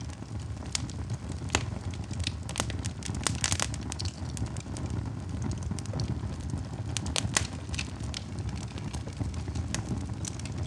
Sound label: Cozy Campfire; Nature Sounds for Sleep and Relaxation